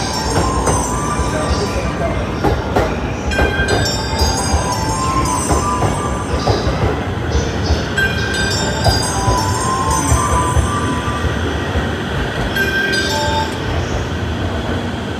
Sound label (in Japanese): JR 常磐線 東京 北 接近, train sounds